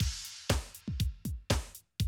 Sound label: 120, Classic Drum Loops